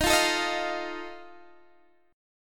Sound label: D sharp Suspended 2nd Flat 5th D sharp, Guitar Open D; x,18,15,15,18,15; chordshape